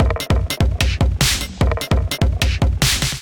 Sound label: Kit 149 bpm, Drums Basic; MIDI Clips; Big Tune Fish Kit; Ableton; Factory Packs; Skitter and Step